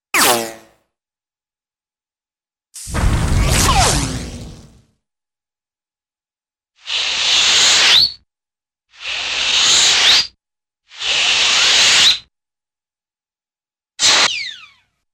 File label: Bullet Ricochet - Explosive Blast - Long Airy Scrape, cartoon; Hollywood Edge; Cartoon Trax